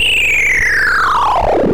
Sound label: win; tileworld; boulder dash